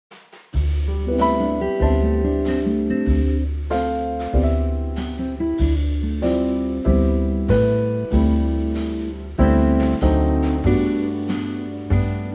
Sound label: G Trio; key - G - vocal range - B to D